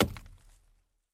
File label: chop, lumberjack